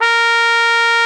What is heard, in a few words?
Trombone D3